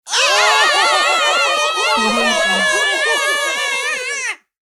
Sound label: cartoon small group yelling in fear